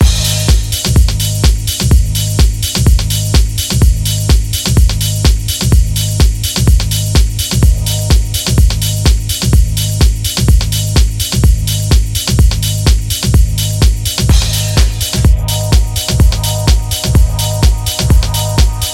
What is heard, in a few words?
Instrumental